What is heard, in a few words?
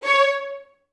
VI C#4, Advanced Orchestra; Best Service